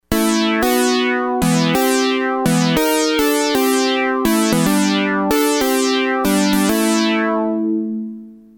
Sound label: synths